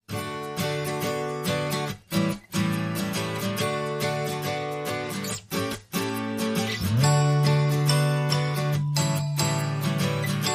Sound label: No Lead Melody